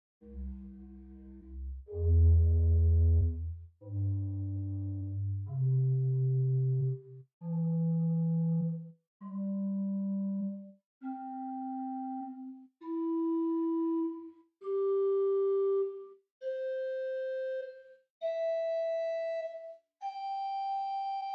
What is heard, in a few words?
Gt Bourdon8 arp, organstops